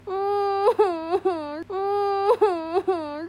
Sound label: cry out loud lol